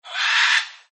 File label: Parrot Screech, Birds; Sound Effects:Animals